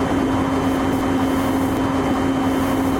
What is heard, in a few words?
E233; tetsuon